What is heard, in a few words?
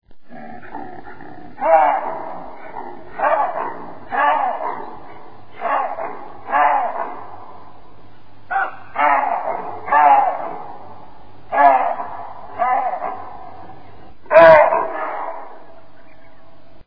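baboon papio cynocephalus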